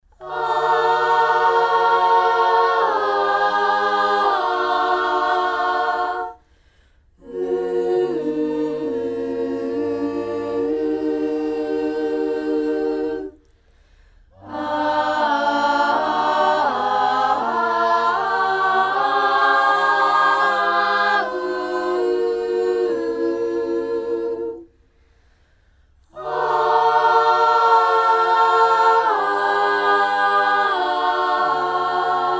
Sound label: Alto, parts